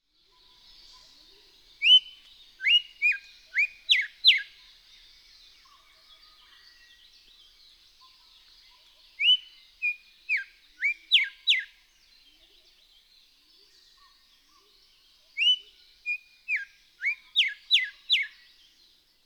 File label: Pachycephala Simplex; Grey Whistler; Call 2